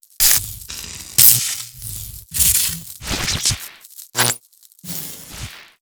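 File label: destruction security camera sparks; Explosions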